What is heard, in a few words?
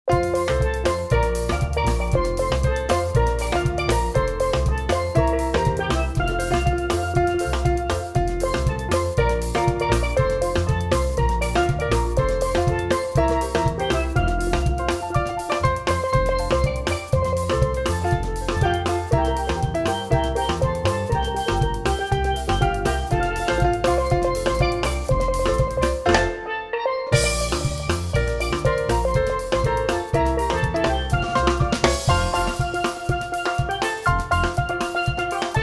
Fire pan trio, ethnic music